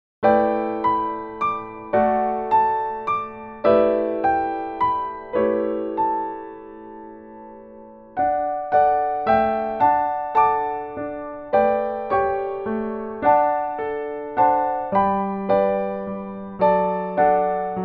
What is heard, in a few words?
Piano